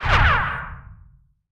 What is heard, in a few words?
ricochet